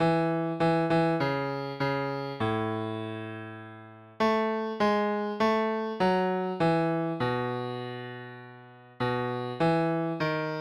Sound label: Bass